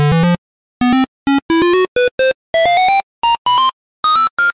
Count up; puchicon; dsi ds

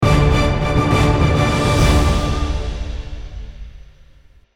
Combat Intense Stinger

